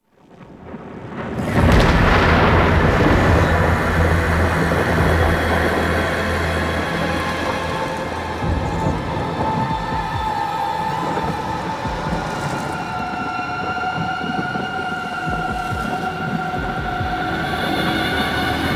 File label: Intro SFX; gameplay; Warframe; NineteenNinetyNine; Stage11FuturePast